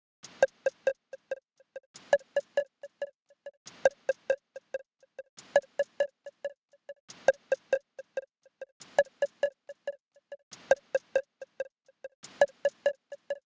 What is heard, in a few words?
Melodic Perc loop; Vignette Kit